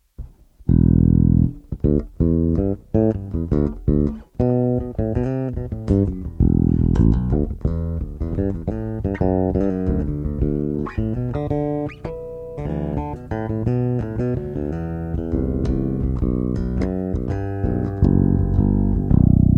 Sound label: Surine mag, bass